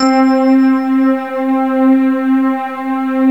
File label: fantasia, synth pad; gmplay